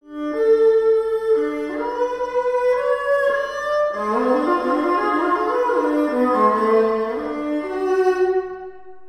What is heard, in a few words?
violin